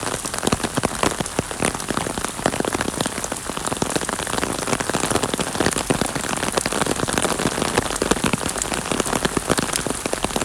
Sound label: Rain Underneath Umbrella